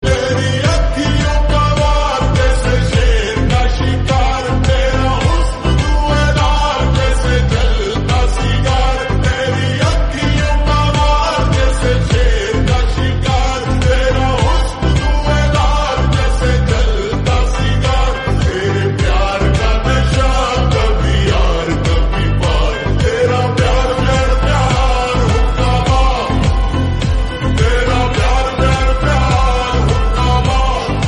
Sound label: Remix Slowed